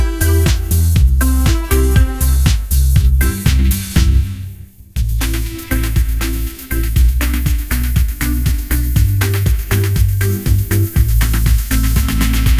sunshine pop groove

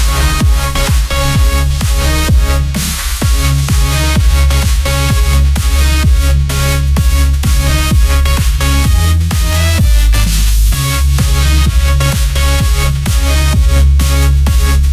festival bass drop anthem, ringtones; ai

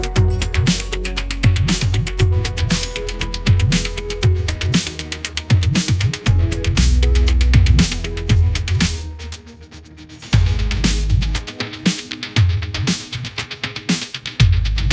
uplifted spirit pop groove, ringtones

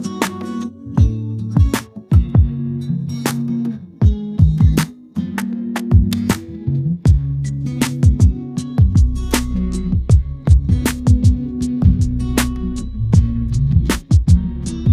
soulful sunset groove, ringtones

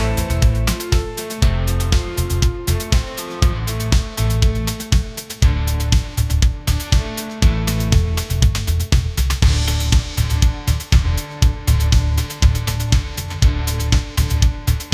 sunshine pop groove, ringtones; ai

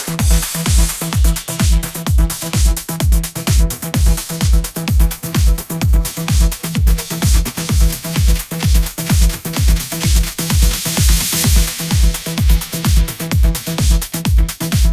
bass drop energy surge, ringtones

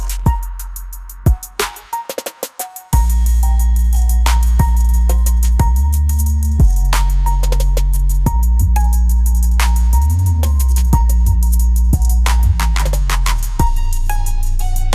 shadow lane trap beat; ai

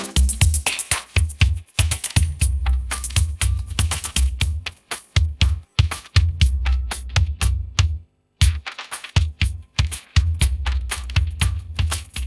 pop vibes; ai-generated

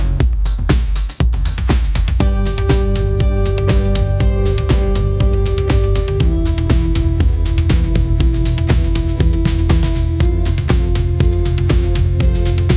bright lights pop anthem; ai

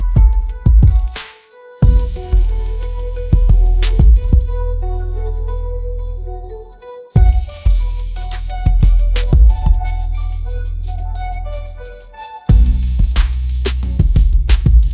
dark trap; ai-generated

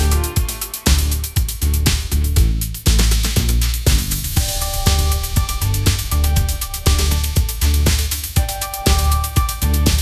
sunshine pop groove, ringtones; ai-generated

